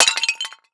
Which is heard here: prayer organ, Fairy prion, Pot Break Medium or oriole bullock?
Pot Break Medium